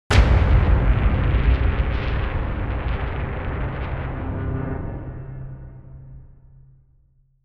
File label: cinematic impact waves